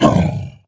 darkspitter death

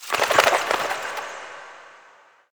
wing flaps

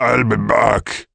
beastmaster taunt death

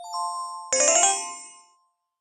hearts

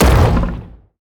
tower stomp path; crocs

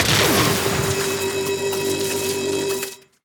tower eldrictch Channelers basic Attack cast

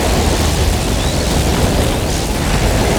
elemental storm; arivan

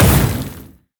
heroes basic Attack impact; kosmyr

